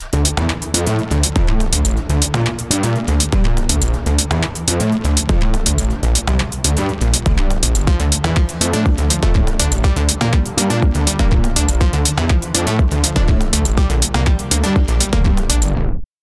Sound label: Arpeggios